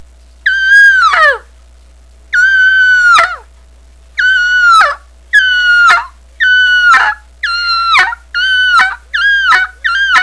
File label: progress yelp, turkey hunting